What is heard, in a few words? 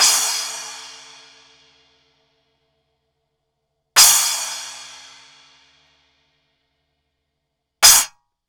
SPECIAL EDITION Splash